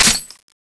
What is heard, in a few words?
clip out, weapons